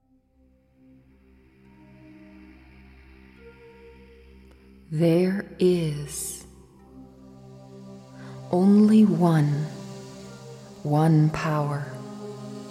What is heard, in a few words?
theta brainwaves; spiritual mind treatment